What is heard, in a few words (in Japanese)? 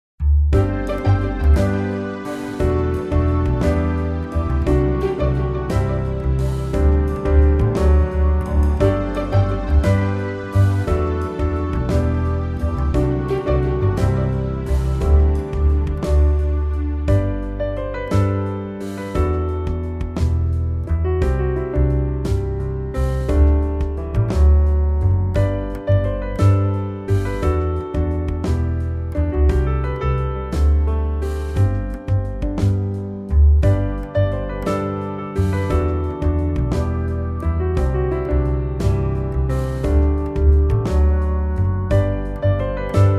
季節 ピアノバンド